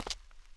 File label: step; WalkFX